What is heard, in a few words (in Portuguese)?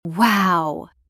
uau wow